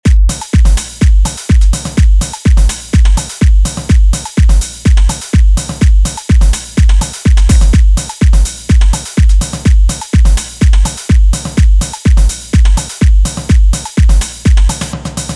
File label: House; Drum Blaster: The Ultimate Beat Generator, Sampler Plugin for Modern Producers